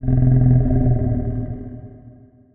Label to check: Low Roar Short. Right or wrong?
Right